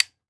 Weapon Gun Shotgun 12 Gauge Benelli Automatic Safety On; AdobeCC